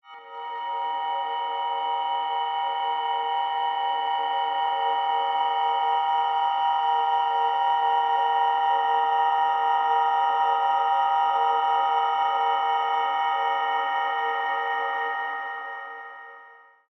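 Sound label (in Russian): мистическая атмосфера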